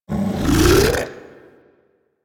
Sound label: Monster Spit Inhale; Warframe; Infested